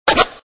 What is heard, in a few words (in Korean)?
아이템; deepsky